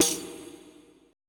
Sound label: TAMB